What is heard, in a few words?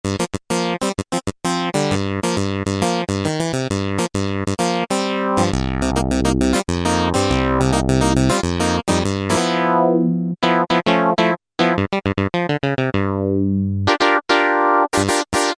Korg microKORG Synth Clav; Factory Voices